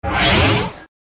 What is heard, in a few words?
Dark Spirit Block